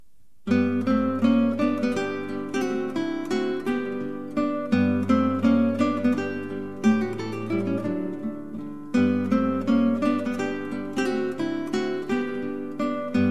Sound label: waltz, duo